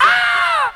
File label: ow